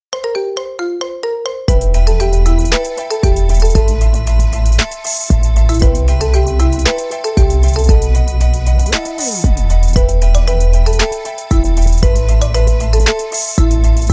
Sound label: marimba remix; iphone